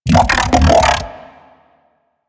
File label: Mech Ambient Vocal; Nechro Mech; Vehicles